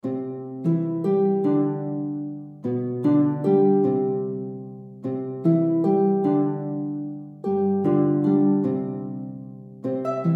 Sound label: Salsa for 2 harps